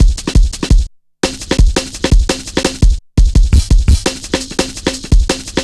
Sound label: 170, Drum Loops, Drum & Bass